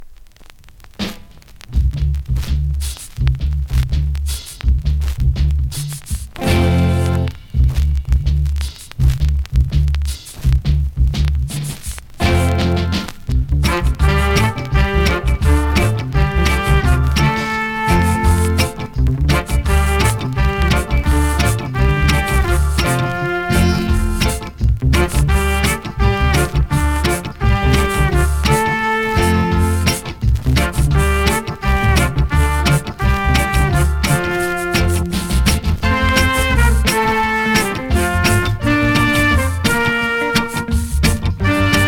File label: REGGAE